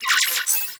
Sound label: Digital Glitch; Corpus Railjack